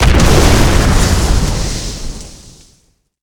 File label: space explo; Quake 4 Alpha; vehicles; strogg flyer